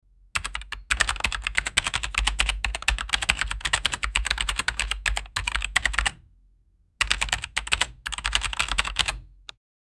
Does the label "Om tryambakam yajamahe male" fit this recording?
No